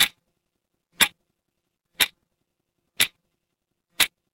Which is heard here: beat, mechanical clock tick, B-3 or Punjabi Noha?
mechanical clock tick